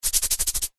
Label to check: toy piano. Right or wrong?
Wrong